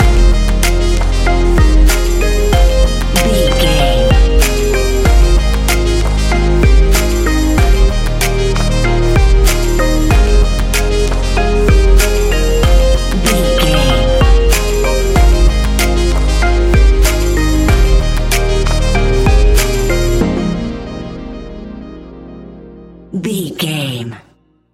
sunkissed rendezvous, A fast paced piece of club music; A fast paced piece of club music perfect for the nightclub, and a youth orientated visual scene.